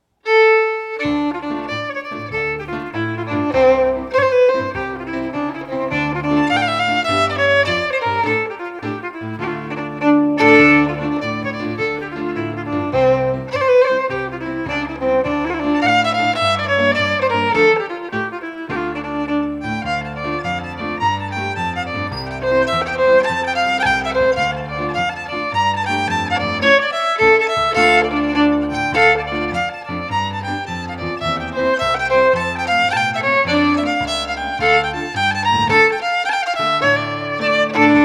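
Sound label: Reel, Scottish Fiddling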